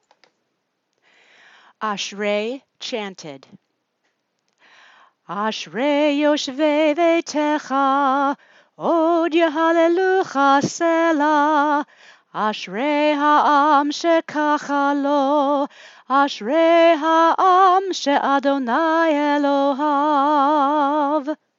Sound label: Ashrei Chanted